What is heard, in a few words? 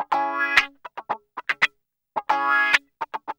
GUI 110 9.C#; Best Service Gigapack 2